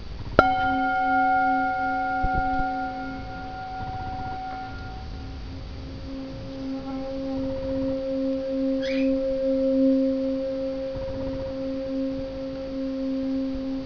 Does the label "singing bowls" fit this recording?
Yes